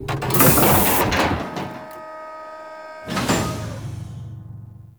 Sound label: Mover Hands; Con Junction; Warframe; Ambience; Entrati